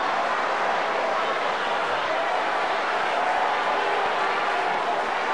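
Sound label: Basketball Crowd Ambience Loop; Crowds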